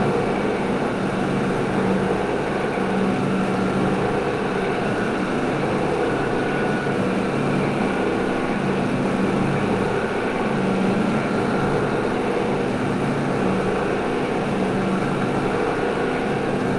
motor electric lighthouse 4th floor inside lightroom lantern low rumble; west point pei; sonniss gdc